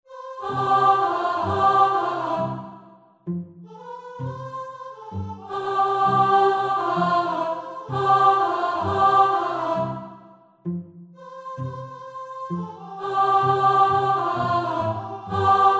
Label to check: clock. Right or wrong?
Wrong